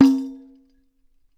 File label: JING DRUM, Ritual Drums; E-MU Producer Series Vol. 7 – Old World Instruments